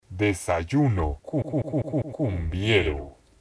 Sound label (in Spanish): desayuno cumbiero